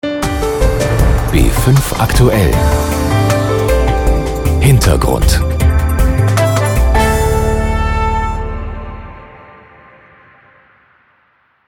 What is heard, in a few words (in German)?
Opener Hintergrund